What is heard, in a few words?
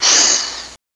Snake Hiss, Close